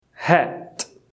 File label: hit